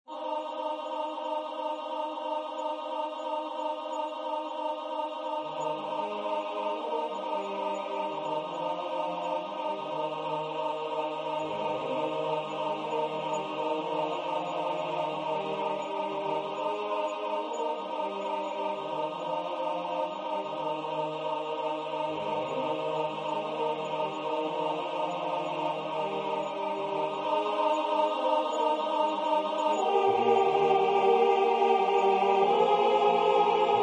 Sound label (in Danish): sopran